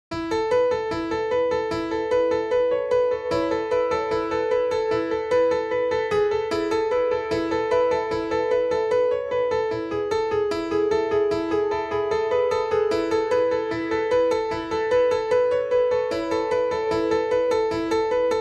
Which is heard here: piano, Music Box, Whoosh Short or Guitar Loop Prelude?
piano